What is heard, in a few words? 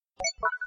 hover pin point